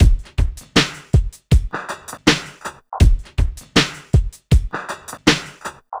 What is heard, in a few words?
Street Tip, R&B Drums